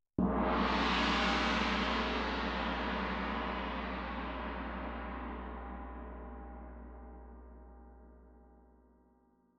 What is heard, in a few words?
Tam-Tam Close